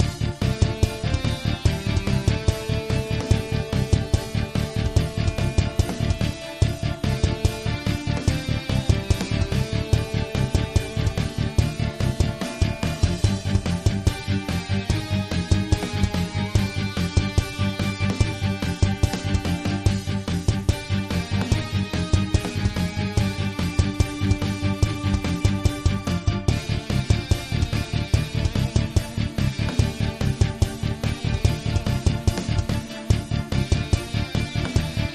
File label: Heavy Metal Dragon Music; dragon city